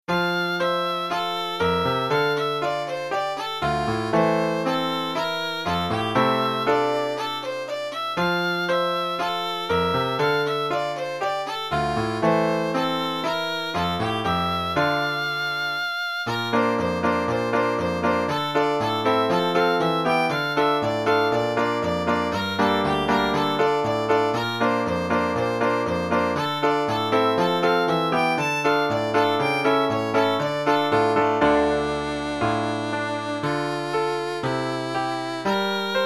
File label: piano